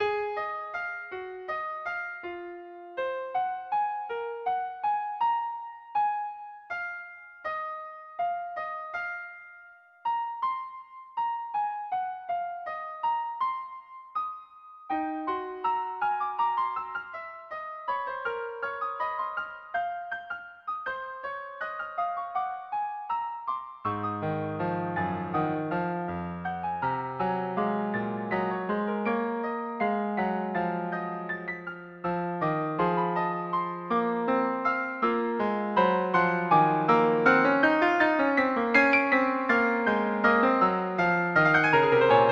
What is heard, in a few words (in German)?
Fuge zu 3 Stimmen Cis-Dur; Op.29 N°4